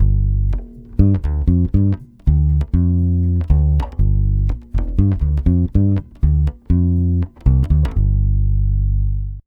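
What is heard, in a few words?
120 BASS G minor, Brazil Bossa; USB Soundscan; AKAI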